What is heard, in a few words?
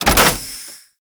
key remove; Ambience; Gameplay; Warframe; The War Within